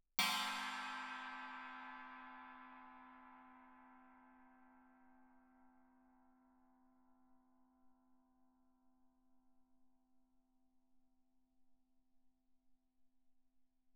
chinese stick normal mf, suspended cymbals; Percussion

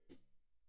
hihat, foot close, pp; Percussion; cymbals

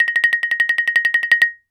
Xylophone hard rubber roll ff Bb6 stereo; MIS Pitches - 2014; Percussion

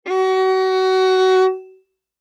Violin arco ff sul G Gb4; MIS Pitches - 2014; Strings

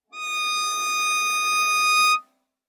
Viola arco ff sul A Eb6 stereo; MIS Pitches - 2014; Strings

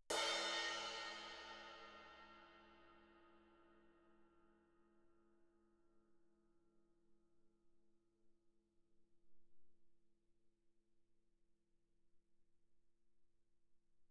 crash stick shoulder mf, suspended cymbals; Percussion

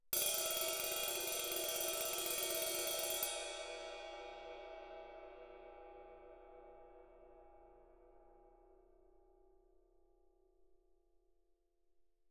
21 ride, stick, roll, suspended cymbals; Percussion

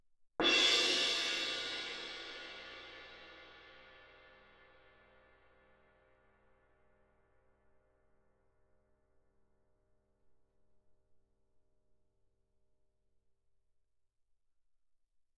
crash mallet ff, suspended cymbals; Percussion

